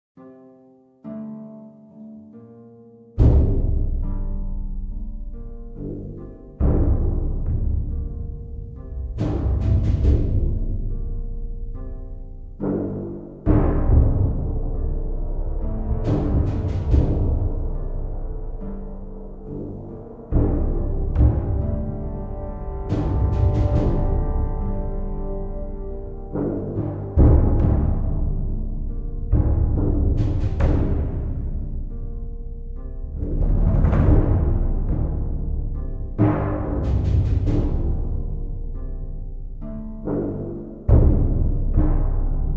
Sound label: Tense; Star Trek Resurgence